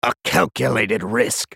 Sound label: attack; Swain; en US